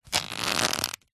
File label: leather wallet bend stress creak